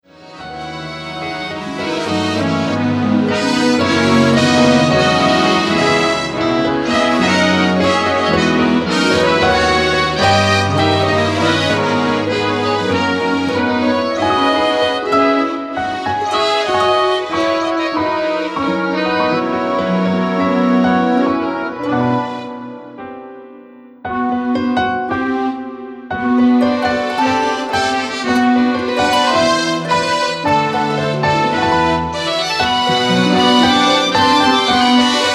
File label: piano concerto